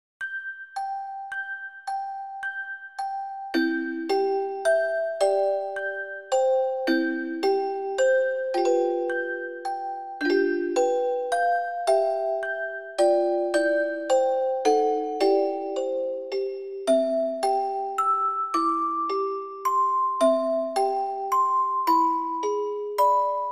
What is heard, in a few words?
rock a bye; Rock-a-Bye, Baby（ロッカバイ・ベイビー）/イギリス民謡